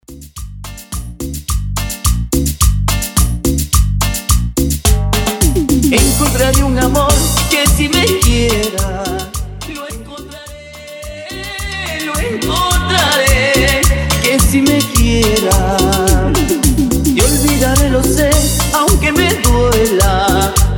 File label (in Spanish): Intro acapela, Bpm 106